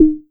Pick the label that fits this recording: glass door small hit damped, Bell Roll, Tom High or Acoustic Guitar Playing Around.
Tom High